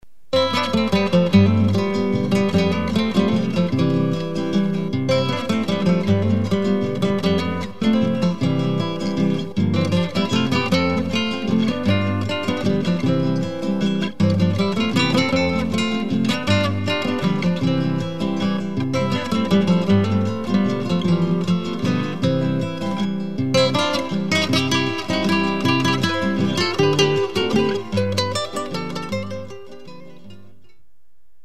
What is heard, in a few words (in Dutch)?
gitaar wals